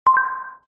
tono aviso corto metalico; whatsapp

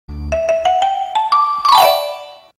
tono piribiri ascendente; whatsapp

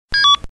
ringtone clasico mensaje; whatsapp

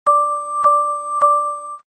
aviso gradable para whatsapp android

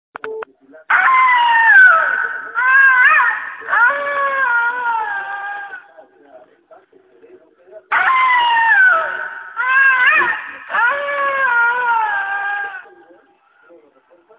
aullidos de lobo

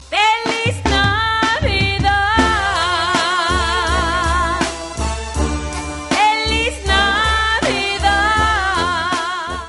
tono; whatsapp

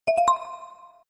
sonido tritono 3d

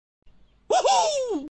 tono hu hu; android; whatsapp

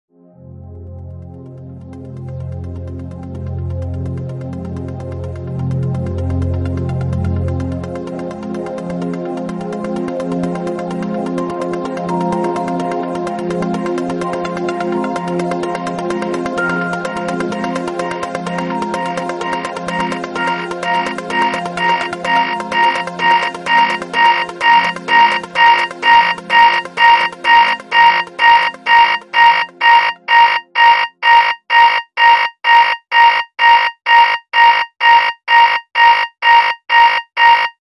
tono alarma ascendente; whatsapp

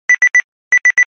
tono alarma de reloj digital; whatsapp

